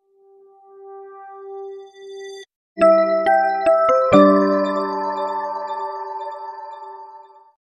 boot audio

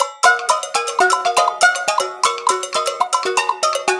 Savannah, ringtones; Samsung SPH-D700 Epic 4G

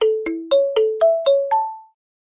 Kalimba, ringtone; Samsung; Gear S3 Frontier; Ringtones

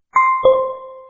Didong; Lenovo; A369i

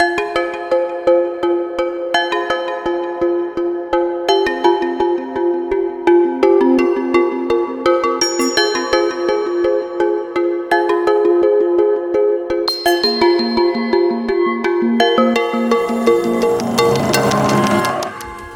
Flicker, ringtones; U2

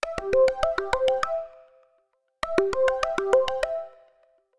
Electronic; Samsung; Galaxy E5

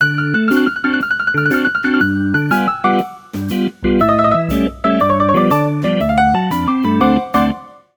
Witty, ringtones; Xiaomi; Black Shark

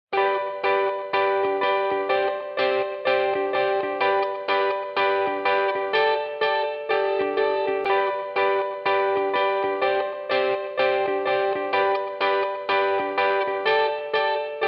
Melody, Ring; Doro PhoneEasy 626

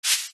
Slide close; Nokia 6267; Waves

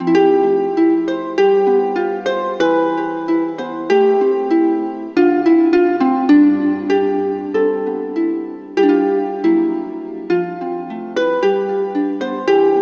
Harp Song, alarm; Pantech; Discover P9090; alarms

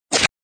click, shutter; Kyocera; KX5 Slider Sonic Virgin Mobile

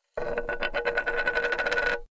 Coin, notifications; Bluboo; S8

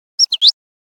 Tweet; Nokia; Windows Phone apps

